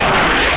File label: white noise; Japan